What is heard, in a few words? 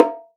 Bongo Lo; Roland SPD-S